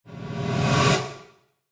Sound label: Whoosh Secret Door; Whoosh Sound Effects; sound design